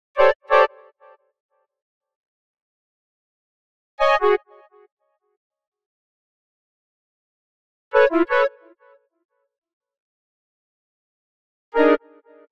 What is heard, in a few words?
Arcade Jingle Negative